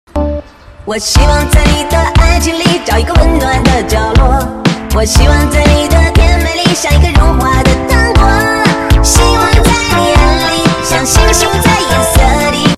China English Disco Music

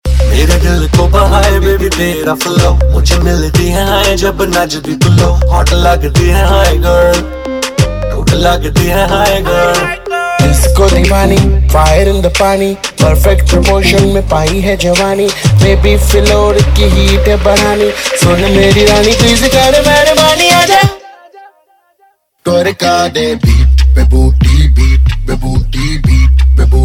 Ringtone, Bollywood; A Flying Jatt

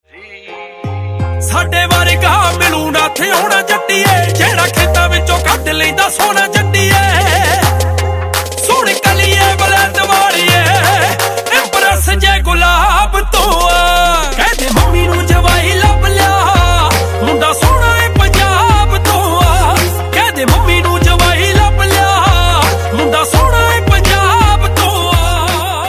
Punjab Ton Ringtone

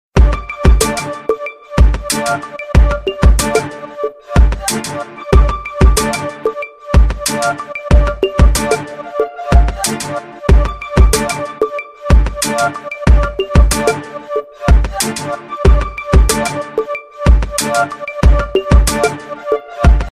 Htc Business Tone

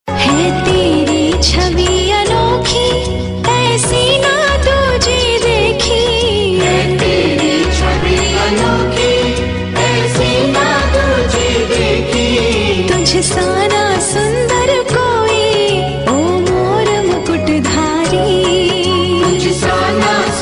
Janamasthami Bhajan Tune; Yeh Rishta Kya Kehlata Hai

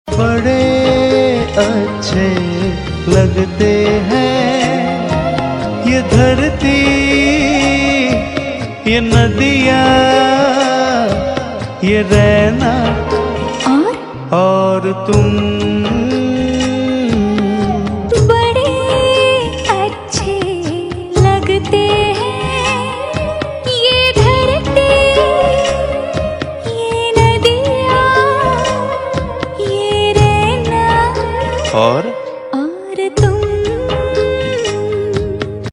Duet Version; TV Serial Tone; Sony; Bade Achhe Lagte Hain

